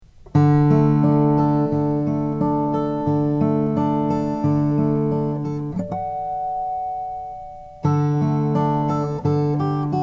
roy noble dreadnought pau ferro

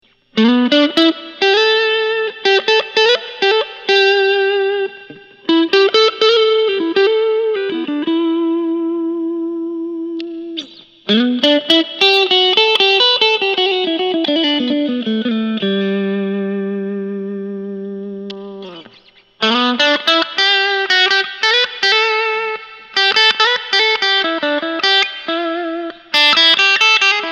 fender teleca black

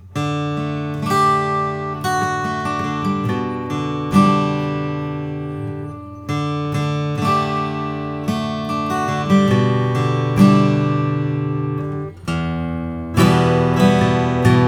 martin 000 28; clapton

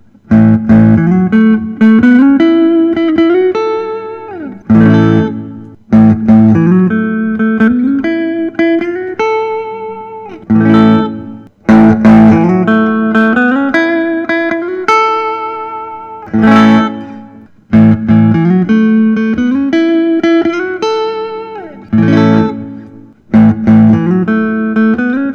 artinger semi hollow; raspberry

